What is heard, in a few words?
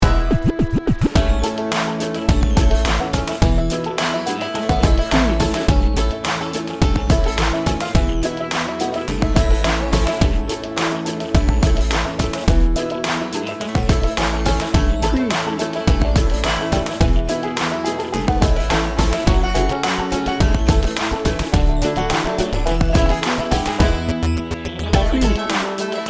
hip hop bluegrass